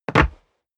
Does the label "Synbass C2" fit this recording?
No